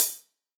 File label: Acousti Hat Hit, hihat; ultimate hihat; Hits